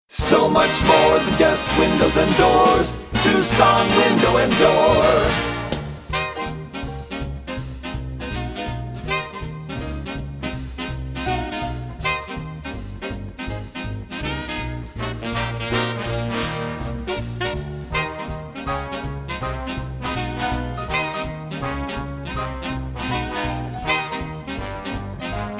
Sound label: BIG BAND JIN